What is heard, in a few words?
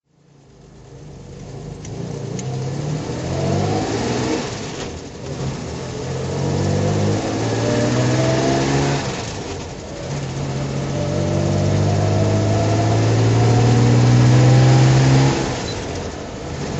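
Jaguar 340 manual drive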